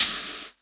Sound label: Elektron Monomachine, Drum Machines